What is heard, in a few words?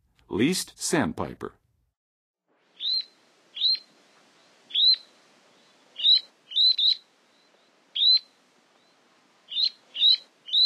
Least Sandpiper, Birds of North America; Western USA; Western USA Songbirds